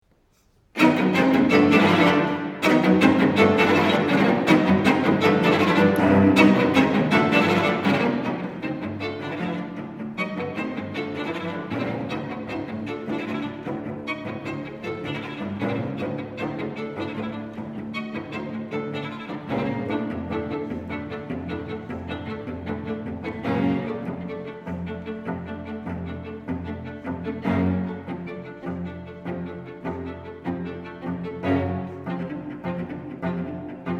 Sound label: Quartet